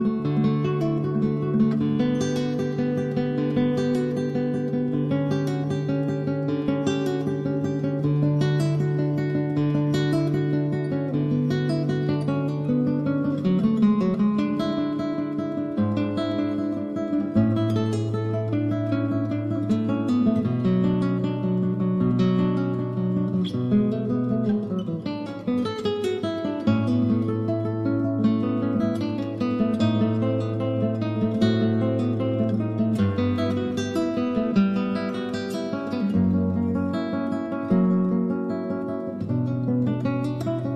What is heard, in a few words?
Cello Suite No. 1- Prelude